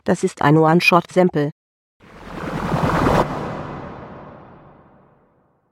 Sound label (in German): Das ist ein One Shot Sample